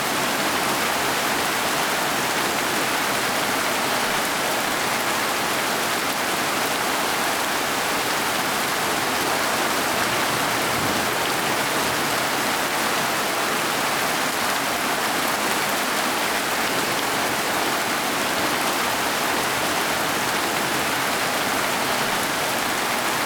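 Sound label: ambience Heavy Rain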